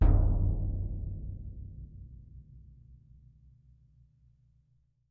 BD2, Percussion

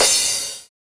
808 & 909 Kit CRASH; Roland Juno-D

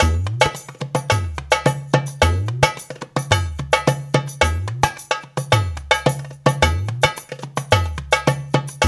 Dara Dance, Darbuka; Spectrasonics - Supreme Beats - World Dance

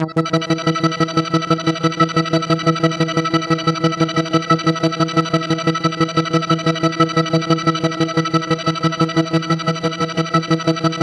C4, SynthLine; 90 s Sample CDs; NiceBeats; SynthLine VOL-7

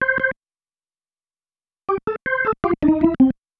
Single Riff, Organ

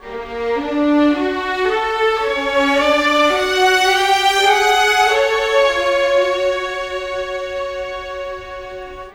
Cinematic Viola Violins; Orchestral; Sonic Foundry (Sony Creative Software); Orchestral Series VOL-3 - Cinematic

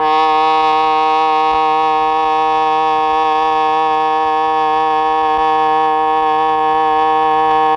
MEL OBOE

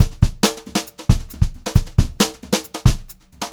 Brushed Drum Loops; USB Soundscan vol.39; AKAI; HOTROD

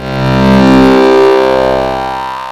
RESYNTH, SYNTH PATCH; Soundengine - CSR-000 The Art of Transwaves

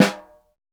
Snare, Roland LA Composer

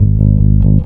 LOOP BS, LOOPBASS; Big Beat Samples & More

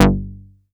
MOOG BASS G2; Best Service Dream Experience

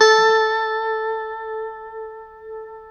A JC120; Guitars; Spectrasonics; Hans Zimmer Guitars VOL-1; JC-STRAT MF

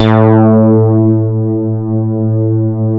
SH 1000, BASSES

